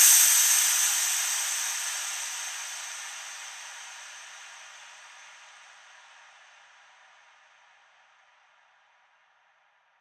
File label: Hat Crash; Psytrance; FX